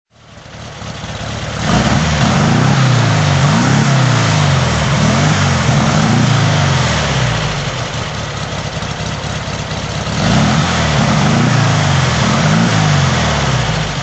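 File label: porsche 356 pre A 1500S throttle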